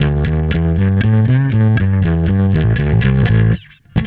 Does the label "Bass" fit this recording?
Yes